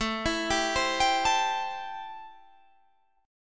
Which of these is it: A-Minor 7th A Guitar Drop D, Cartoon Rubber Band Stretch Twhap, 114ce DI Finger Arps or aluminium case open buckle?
A-Minor 7th A Guitar Drop D